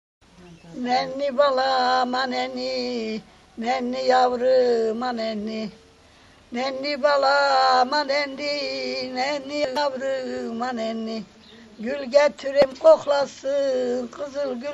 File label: nenni; turk